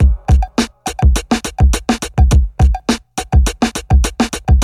103 bpm breakbeat sample c key, kick tuned to the C note